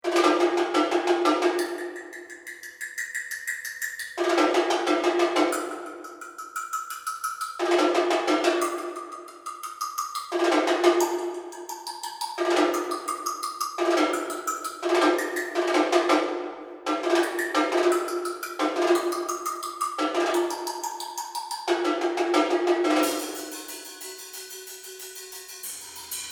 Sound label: bells, marimba